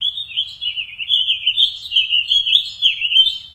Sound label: warbling vireo calling